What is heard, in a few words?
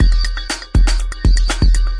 electro phasey percussobreak, 120 bpm